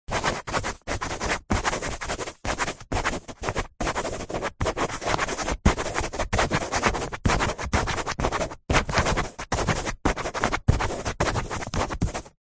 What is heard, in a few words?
pen ball point writing fast on thick paper